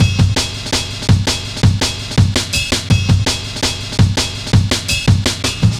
Samurai, Drum Loops, 165.5, Jungle

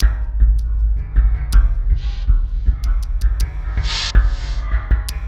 RISK FX, Industrial Loops

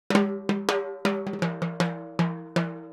Timbali, Latin Percussion

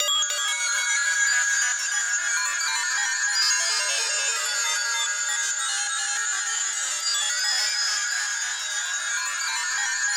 EL.CHIME 4, Yamaha VP-1, ELECTROCHIME; Music Store; 90's Sample CDs

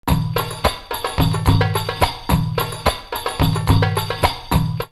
San'a 108, drumloops World & Ethnic; X-Static Goldmine

